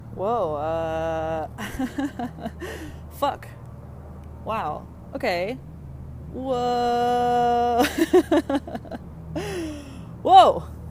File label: whoa